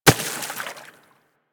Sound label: Bullet Impact Water; Warframe; DE; Weapons